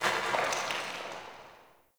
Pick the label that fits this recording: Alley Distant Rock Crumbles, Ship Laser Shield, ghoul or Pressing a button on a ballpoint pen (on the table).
Alley Distant Rock Crumbles